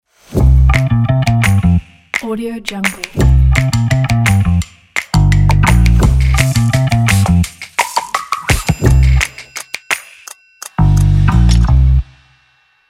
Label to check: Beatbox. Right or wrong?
Wrong